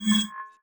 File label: inv rollover, player, inventory